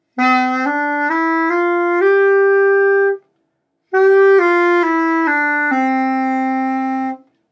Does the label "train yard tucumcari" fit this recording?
No